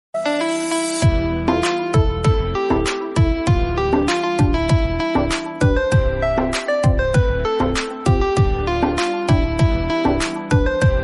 Instrumental Ringtone Romantic Ringtone Punjabi Ringtone; Ar Records